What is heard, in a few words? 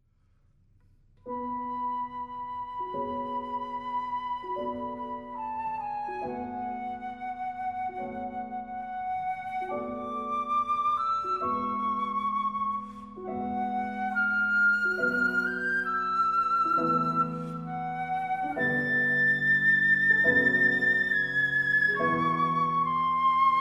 Flute & Piano - Sehr langsam